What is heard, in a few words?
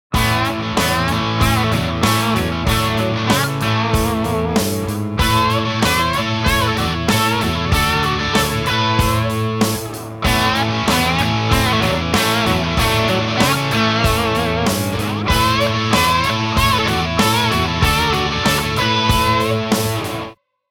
Crunch